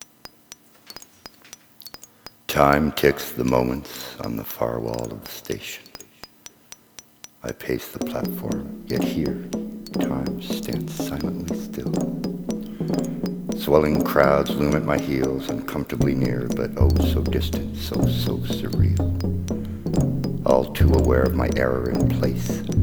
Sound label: Beats